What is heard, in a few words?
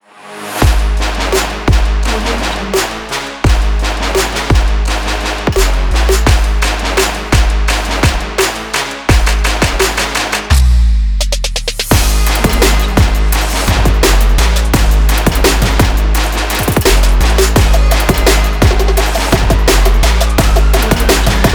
trap energy sport logo; puremusic